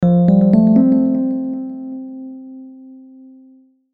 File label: Logo Electric Piano Ascend; Blastwave